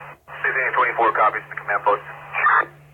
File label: wounded; Ready Or Not